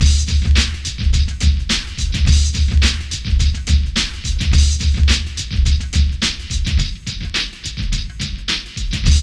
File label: PHAT LOOP; E-Lab; X Static Goldmine 2; akai